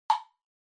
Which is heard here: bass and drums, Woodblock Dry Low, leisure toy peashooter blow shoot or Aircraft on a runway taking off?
Woodblock Dry Low